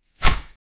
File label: 2d game sound with a